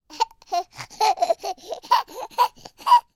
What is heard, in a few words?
human toddler 20 months old laughing